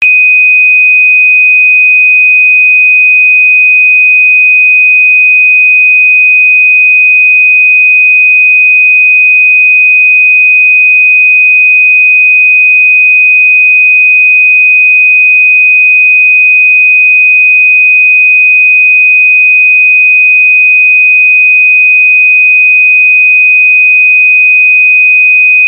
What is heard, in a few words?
sine 2500 Hz 2.5kHz